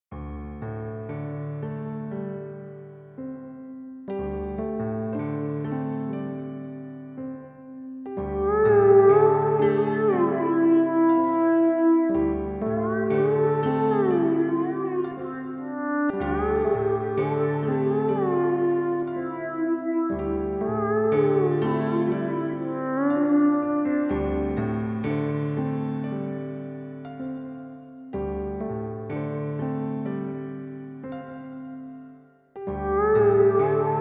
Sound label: PIANO; Piano-Term